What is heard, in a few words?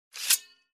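sword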